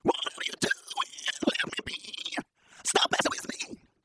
Singing Voice

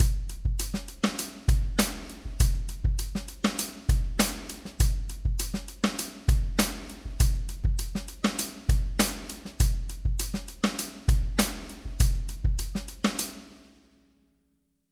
Wave Drum SEP MIX

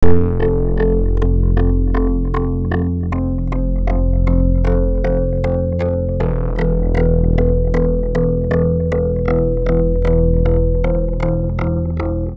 Synth Poly; WSOLA; TSMtoolbox

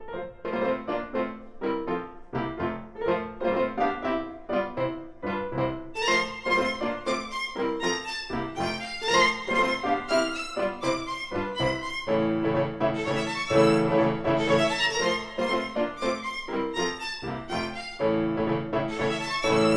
Sync Wavetable Soni

